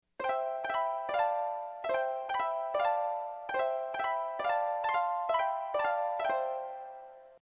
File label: harp; ultima